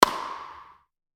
tennis shot

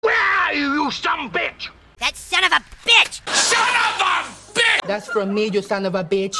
sobs imitate

